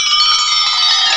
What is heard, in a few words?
BELTREE, BELLS; M8; CMI Factory